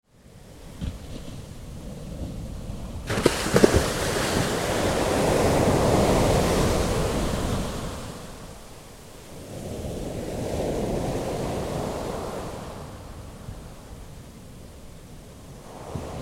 Ocean Waves Rolling onto Sandy Beach, Wide perspective; Wide perspective close shot - get wet; Ocean Sound Effects